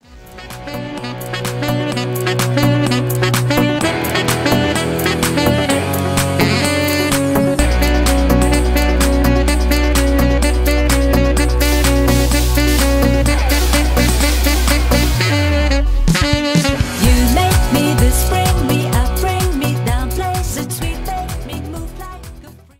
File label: Sax Beat; saxophon